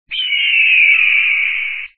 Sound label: safari eagle